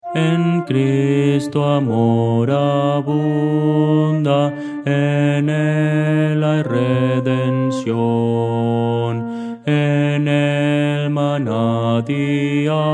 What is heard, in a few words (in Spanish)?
contralto; en cristo amor abunda